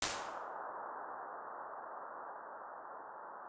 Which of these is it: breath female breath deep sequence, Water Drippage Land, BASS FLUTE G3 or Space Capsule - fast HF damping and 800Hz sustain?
Space Capsule - fast HF damping and 800Hz sustain